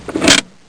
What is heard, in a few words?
Parking Hand Brake; Soundsation; Vehicles